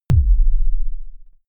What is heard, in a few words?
sub kick noise